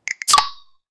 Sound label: beverage